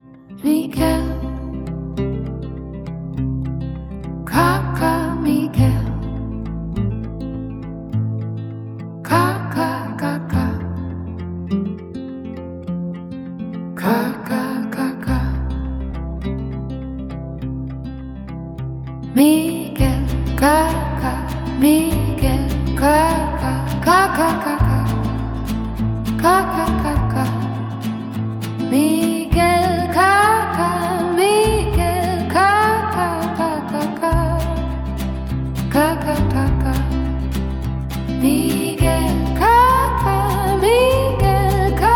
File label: acoustic solo